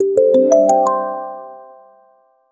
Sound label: a short light educational